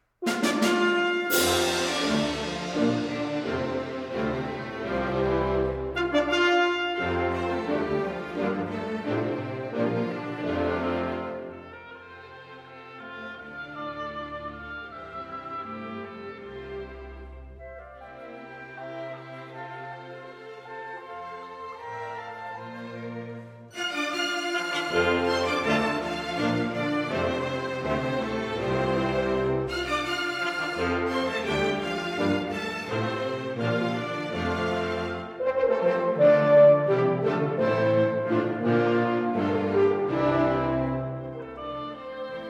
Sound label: Air (African-American)